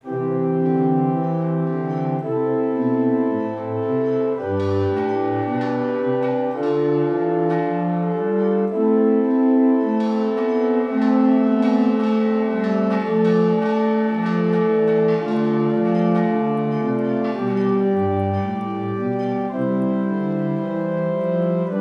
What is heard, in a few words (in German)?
Stimmiger Contrapunkt; Ronge